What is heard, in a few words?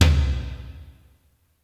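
pop toms, drum kit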